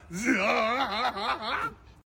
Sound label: zehahaha; tiktok anime deliquent